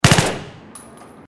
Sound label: pp-19 burst indoors